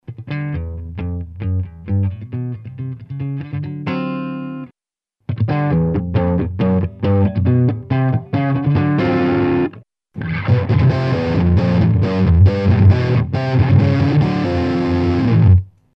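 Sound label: TS Tube Mixdown, guitar; Tube-Section; This section produces great tube tones that go from crunchy to heavy saturated all with a very smooth response.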